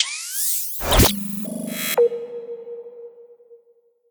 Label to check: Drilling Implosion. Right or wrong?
Right